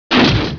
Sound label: BANG